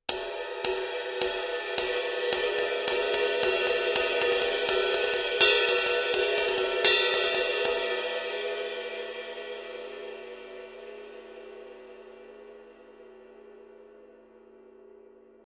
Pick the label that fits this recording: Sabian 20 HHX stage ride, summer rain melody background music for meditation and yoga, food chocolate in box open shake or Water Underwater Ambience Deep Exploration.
Sabian 20 HHX stage ride